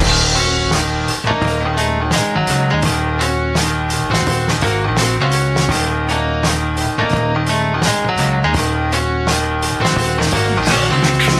piano; Phonky

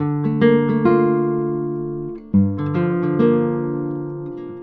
guitar, Jazzmatazz Loops

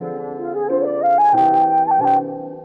flute, Loops

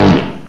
fart short

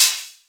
owl, Hats Electro Open

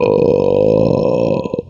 burp medium

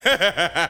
laugh

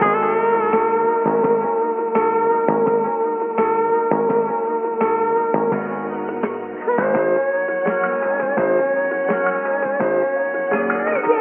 guitar and voice, Loops, Phonky

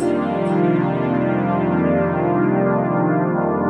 jazz, synths; Notes

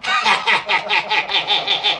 laugh evil

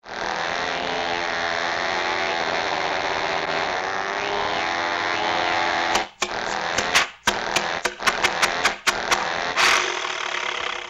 air crash clown

